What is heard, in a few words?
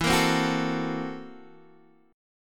F sharp 7th Sharp 9th Flat 5th E